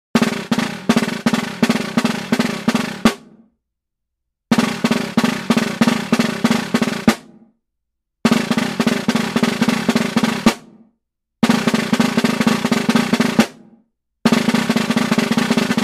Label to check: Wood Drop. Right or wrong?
Wrong